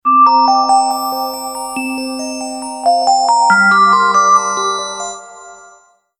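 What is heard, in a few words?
little music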